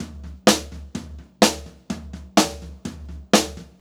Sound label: 126 TOM, Pop-Rock Drumloops; USB Soundscan vol.37; AKAI